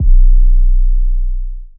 Not Nice 808's

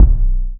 808's

